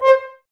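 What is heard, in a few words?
Brass French Horns C; Sample CDs; Miroslav Vitous; Woodwind Brass Ensemble; F.Horns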